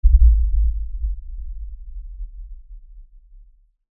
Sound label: LFE Tremor, Lfe Tremor Low Bass Shake Rumble